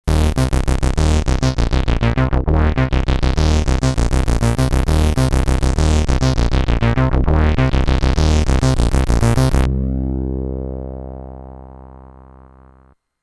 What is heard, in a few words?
bass bazz, HardSID